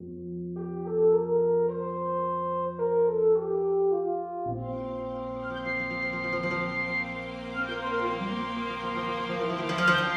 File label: GTR